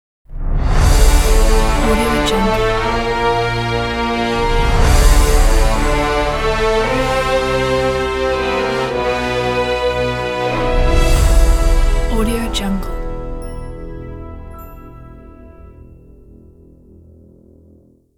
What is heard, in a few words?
dark tense orchestral ident; cinezar